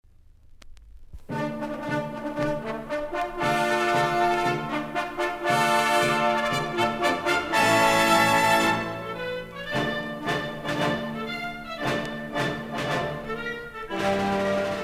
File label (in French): Marche, musique militaire; pour la naissance du duc de Bordeaux 1820; Marches et refrains de l'armée française